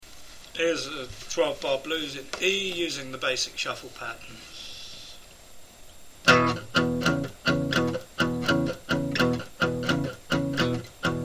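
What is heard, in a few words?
shuffle line, guitar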